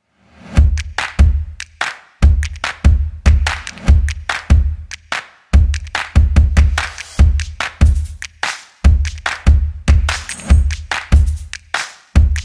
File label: Upbeat Drums Percussion Background Music; For Typography Videos